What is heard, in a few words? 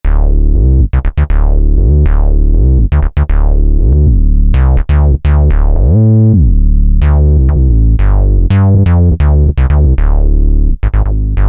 Reso Bass, Yamaha PSR-3000; Factory Voices; Guitar & Bass